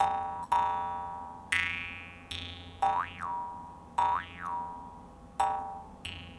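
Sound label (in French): guimbarde, jaw harp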